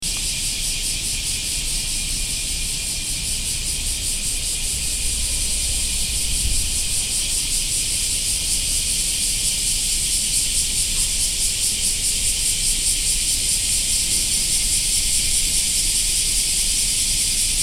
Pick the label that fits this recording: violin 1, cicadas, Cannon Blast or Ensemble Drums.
cicadas